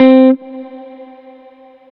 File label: GuitarNote Med C4; ChainPop